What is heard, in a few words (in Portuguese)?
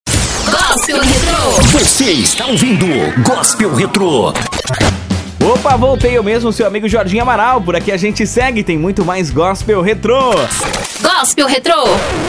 Gospel Retro